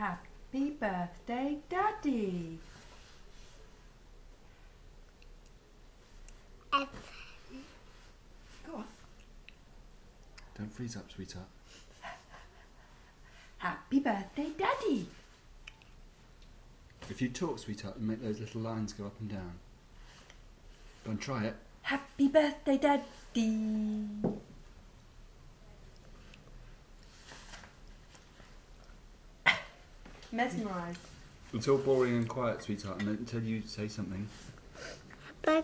happy birthday daddy

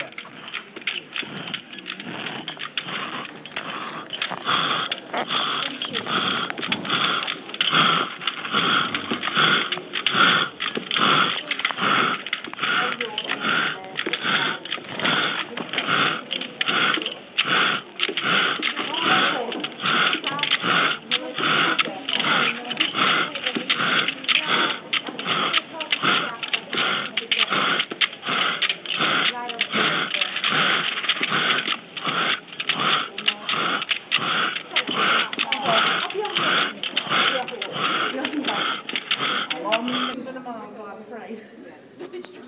foley soundfx of a furnace; for museomixuk

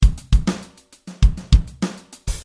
8th note drum grooves

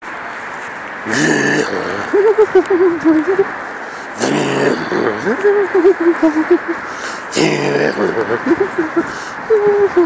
vocal improv & drag through leaves

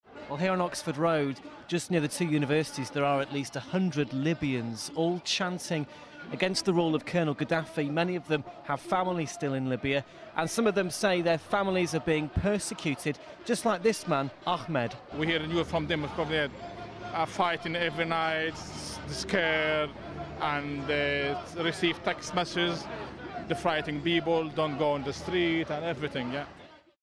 Libya protests in Manchester; real radio

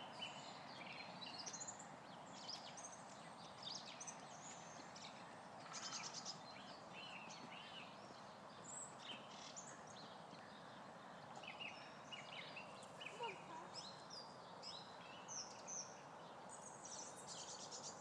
woodpeckers and bird song, Clemson Park Derby; sounds of england

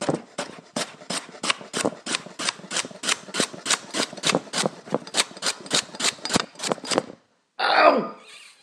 chopping carrots

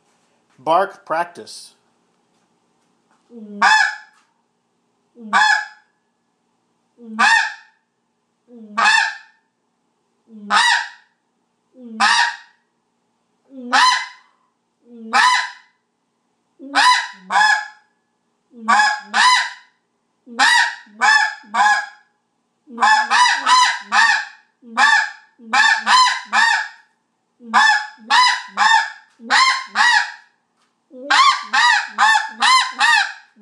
bark practice

